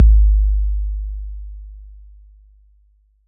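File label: bass drum 808 Decay E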